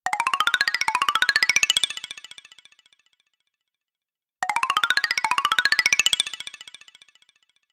Alarm; Oppo; F3 Plus

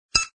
Bleep Open; Kyocera; KX2 Koi; flip

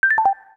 call disconnect; Samsung SGH-T369-Linx; Paragon UX

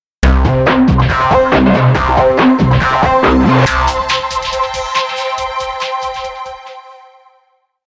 Intonation, notifications; Panasonic; Eluga X1

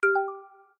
Amicably, notifications; Infinix; XOS 15

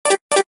Notifier, phonetones, BlackBerry; OS-4.6; Dimples

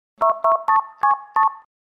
boot audio; Entel Bolivia

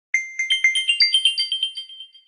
Liquid Notes, notifications

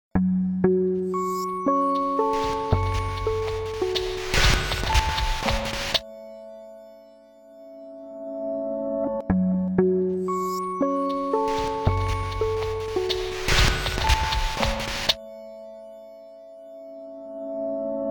Clock alert, Nokia N78 digital tones

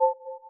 Voice processing; Samsung; Galaxy Pop SHV-E220s; ui

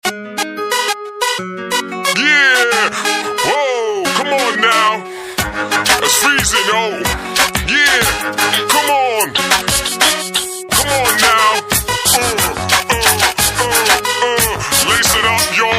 Hip hop guy

